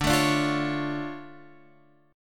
D Minor Major 7th D down Guitar Open F; DmM7 strummed; JGuitar

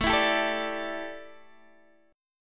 A 7th Suspended 4th Guitar Open D, chord; x,12,14,13,12,12

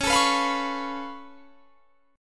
C sharp Augmented 7th, C sharp, down; C#+7; strummed

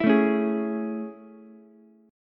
A Major 7th A, Ukulele; AM7 strummed; 9,9,9,11; JGuitar

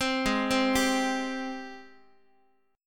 G sharp Major 7th, Guitar; arpeggiated; x,x,3,6,4,6; 4A,4E,5A,5D,5G#,6C#; G#M7

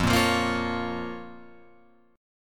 F Suspended 4th Sharp 5th F down Guitar Open F; Fsus4#5 strummed; JGuitar

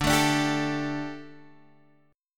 D Suspended 4th; x,5,7,7,8,5; strummed

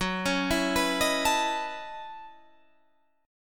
F sharp Minor 13th, Guitar, Standard; arpeggiated; JGuitar

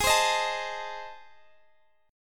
A Minor Major 7th Flat 5th

